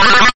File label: Robot talking; gridtracker